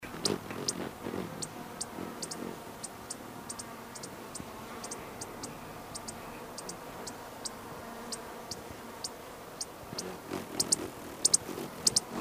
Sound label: Costa's Hummingbird female; Hummingbirds Swifts